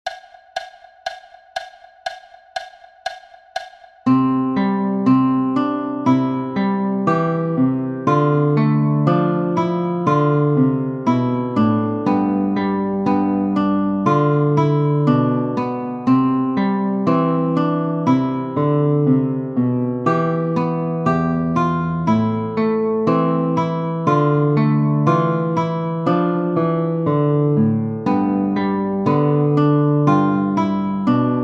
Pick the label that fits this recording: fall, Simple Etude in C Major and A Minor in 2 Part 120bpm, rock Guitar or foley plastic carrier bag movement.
Simple Etude in C Major and A Minor in 2 Part 120bpm